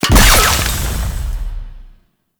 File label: Sabotage Target Destroy; Warframe; Nokko Colony